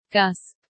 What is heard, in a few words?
gasp